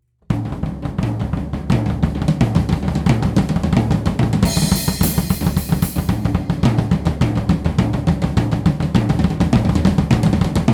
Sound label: drums accel